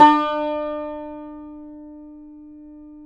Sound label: yayli tambur